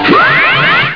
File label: Homing pigeon appears; Maelstrom 1.02